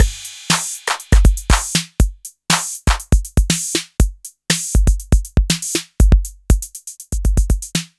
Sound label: 808 SOFT; Wizoo - Platinum 24 Electronic; DEMO KIT